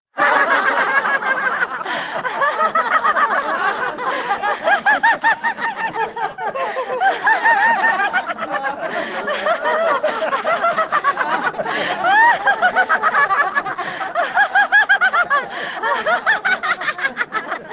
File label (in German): Lachyoga